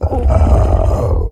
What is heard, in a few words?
pain, procoptodon; zoochosis pack